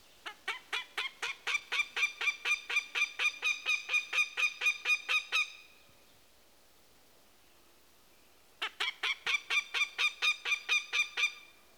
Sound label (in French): l'épervier brun, l'épervier de Cooper et l'autour des palombes, oiseaux